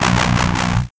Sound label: Sound FX System Grind Sizzle; Ochimaru